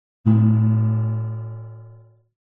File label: Metal Pan Impact Hit - Large Baking Tin; Hollywood Edge; sound designer's toolkit v2